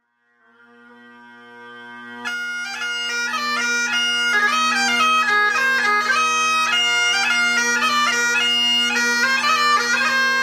Recessional Tunes, bagpipes

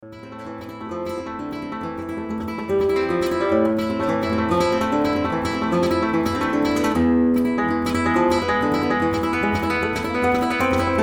rumba, flamenco guitar; Lliam Christy

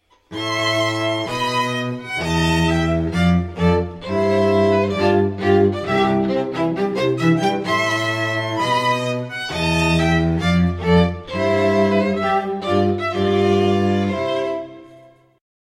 Quartet, string ensembles

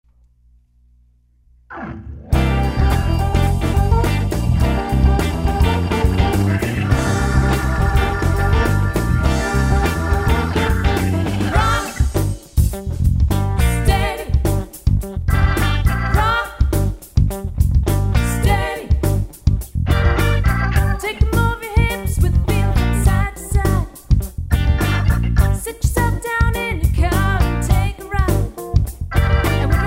R and B dance; Rb; demo

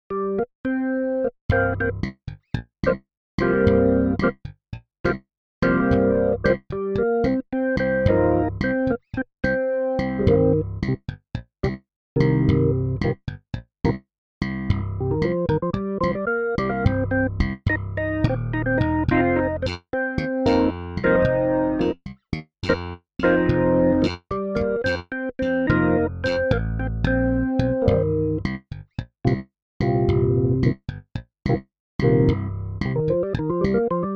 party piano

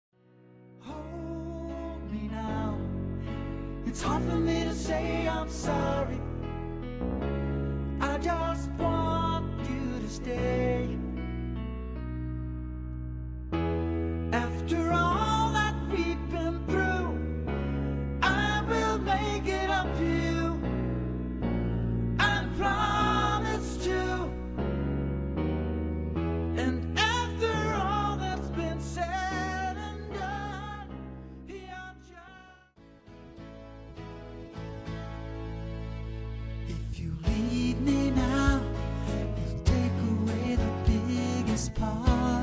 String Quartet; Gabriella Strings

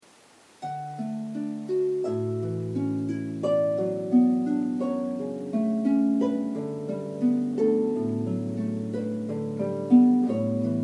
Canon in D. Pachelbel, harp

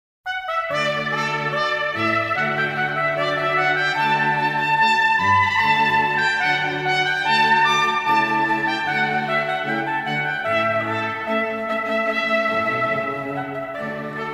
string quartet

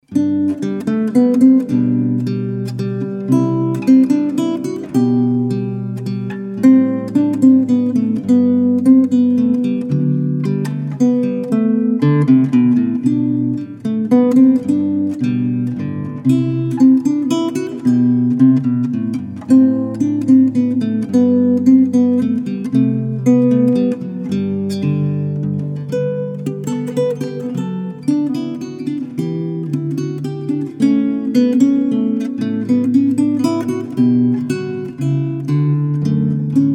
Minuet, classical guitar

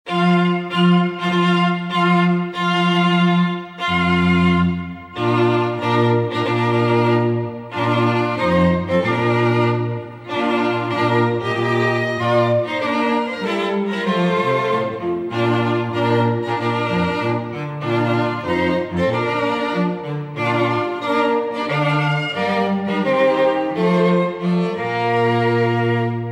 Classical String Trio; Wedding March

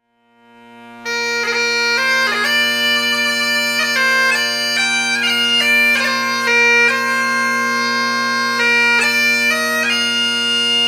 Danny Boy, Bagpipes; Folk

